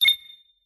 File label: Futuristic Technology and UI Solo Beep; Slava Pogorelsky